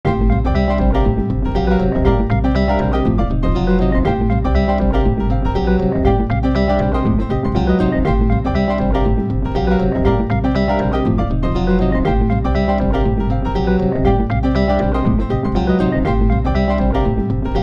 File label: Classical Clash